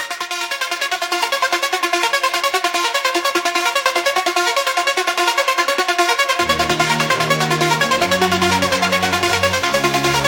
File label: dubstep music